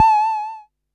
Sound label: Perc Synth Raw; sci-fi